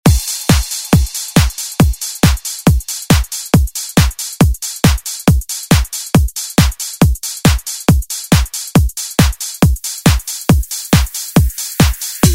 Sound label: ReDrum, trance; DJ Mhark